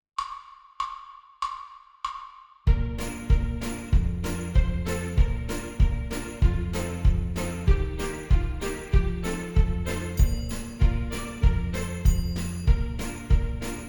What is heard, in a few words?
Horns in Fifths